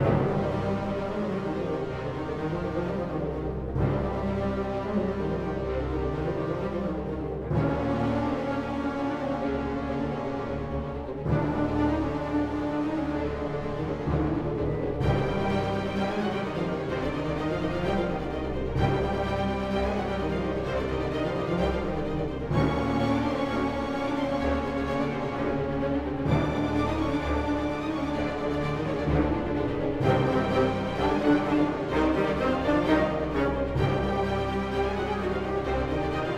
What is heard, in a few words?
Dramatic 64bpm Loop; Music Dialogue